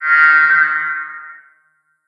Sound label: inside alarm